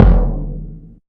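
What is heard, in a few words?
bass drum, left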